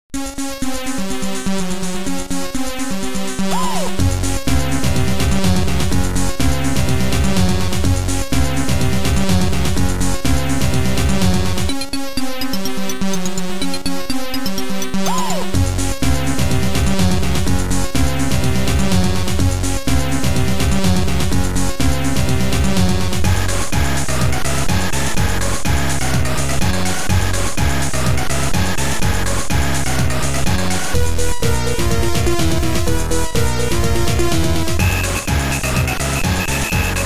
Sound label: Techno Party; Apidya; Amiga